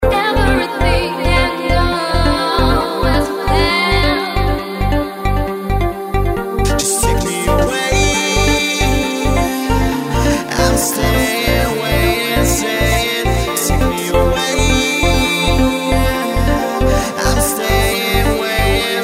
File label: Flytrance 135bpm G